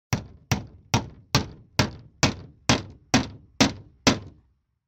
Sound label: Hammer, Hammering nail